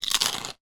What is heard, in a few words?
human person bites on breadstick